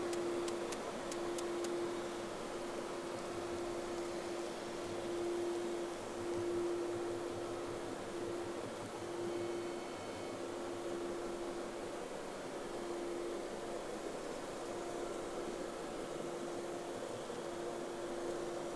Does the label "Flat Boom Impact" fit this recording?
No